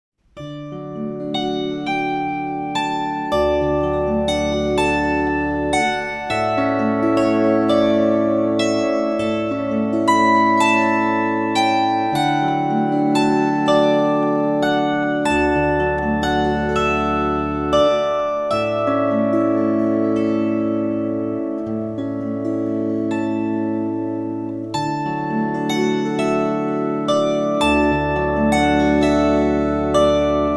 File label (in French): Adagio du concerto pour guitare; A. Vivaldi